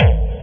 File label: hardbassdrumz, breakcore; breaks; Bazzdrumz